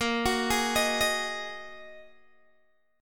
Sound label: Bb 7th Suspended 4th Sharp 5th Bb down Guitar Open G